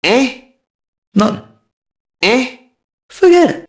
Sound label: mobster group noise; Max Payne